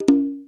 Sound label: ding down